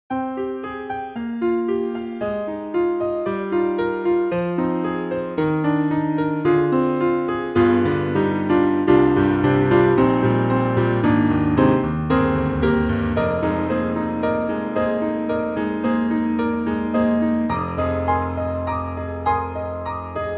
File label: B290 Dry 16 layers; EastWest; Quantum Leap Goliath